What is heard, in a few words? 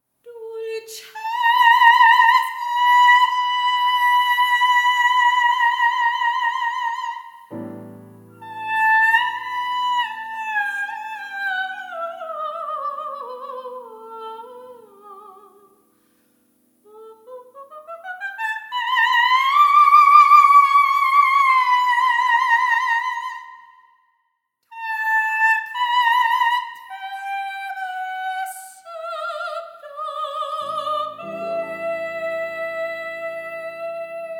Floaty Coloratura Soprano LATIN